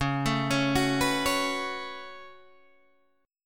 C sharp 7th Suspended 4th Sharp 5th C sharp Guitar Open D; down; chord shape